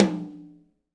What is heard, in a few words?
TOM, Roland, Real Kit